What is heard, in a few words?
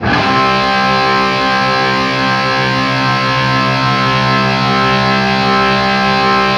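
power C loop, guitar power chord loop; East West; Steve Stevens Guitar Samples Collection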